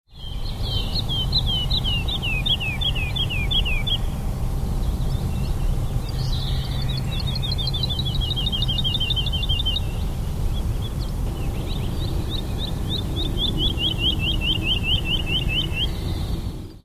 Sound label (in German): heidelerche; Lullula arborea; Lerchen